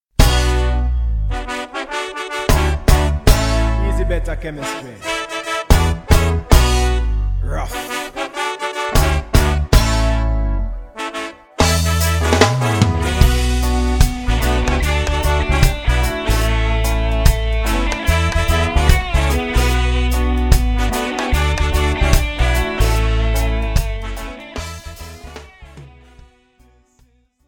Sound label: Reggae